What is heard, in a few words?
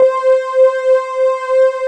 JUP STRING; Pro-Rec - Synthsonic; JV ANALOG2